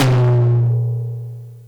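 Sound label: TOM loud; Zero-G; Chemical Beats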